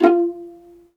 VIOLIN, Synclavier Strings; ILIO

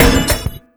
Hit, Fx; Sonic Foundry (Sony Creative Software); Esoterik Beatz; Bello

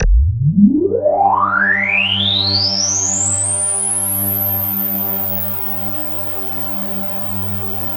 KORG DW8000, Vintage Keyboards; Big Fish Audio - First Call Vintage Keyboards

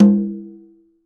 Conga Lo Open; Roland BK-5; Percussion

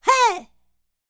Vocal Planet World Voices, NOMADIC

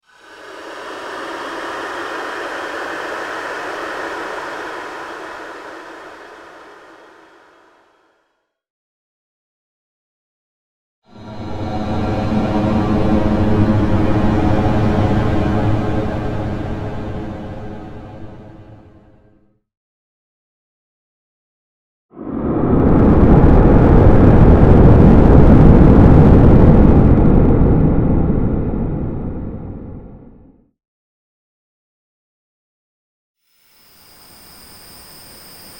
sweepin; indigos; ambient or nature

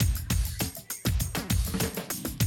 drum loop, 100 BPM; Best Service; XXL 1500 DrumLoops

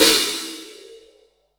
crash L; Zero-G; Chemical Beats; CRASH ZOO

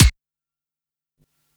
Quasimidi 309, Drums

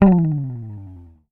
HOFNER, Bass; Sampleheads; Will Lee Bass Library; HOFNER SUS

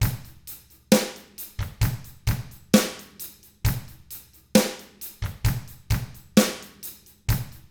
SLOW ROCK, Drums; ILIO; Double Platinum Drums 1

